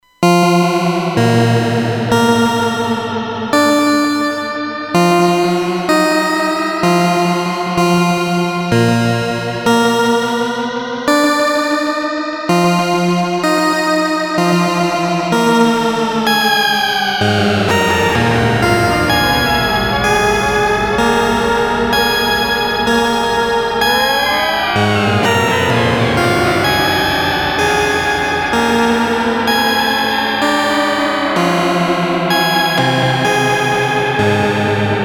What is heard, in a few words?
Analog Style, Dual Delay